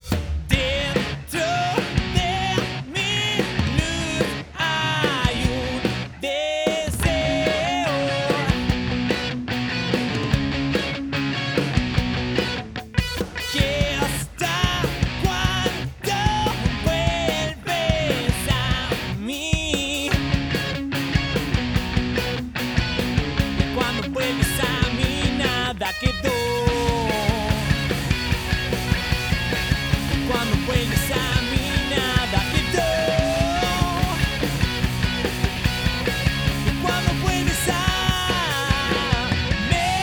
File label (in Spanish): ROCK